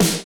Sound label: DANCE SET, DRUMS